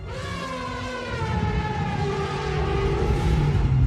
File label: roar; Diclonius